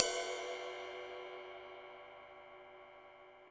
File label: HiHat & Crash, Roland TD-20; HiHats